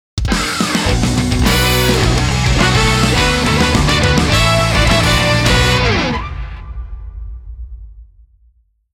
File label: VS Jingle